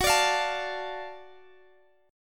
Gb Minor Major 7th Sharp 5th Gb down Guitar Standard; strummed; JGuitar